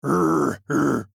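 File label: bear medium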